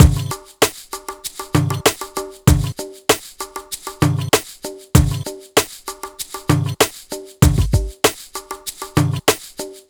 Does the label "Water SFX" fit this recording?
No